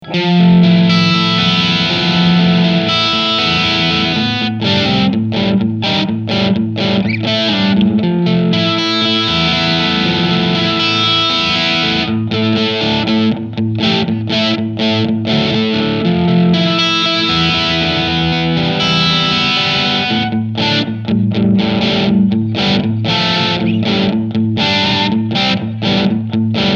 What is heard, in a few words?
Crunch Reverb; Blackstar ID Core 40 V3